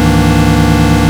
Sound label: Chord